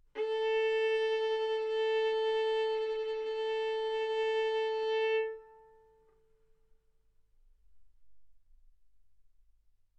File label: Violin arco mf sul A A4 B4 stereo